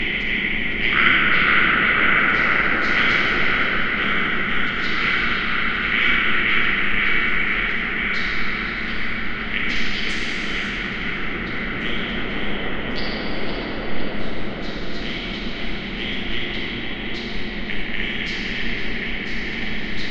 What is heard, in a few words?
Ambience